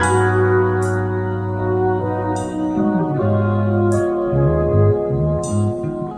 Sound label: flute, Loops, Sad